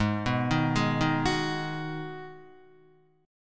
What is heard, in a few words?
Ab Minor 7th down Guitar; arpeggiated; JGuitar